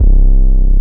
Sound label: BASS, 160 BPM; Ueberschall; Drum & Bass Resonance